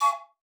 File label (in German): ZUGPFEI; Xsample VOL-9